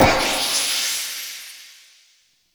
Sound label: Int Echoes Spawn Machine Item Spawn; Ambience; Gameplay; Warframe; Entrati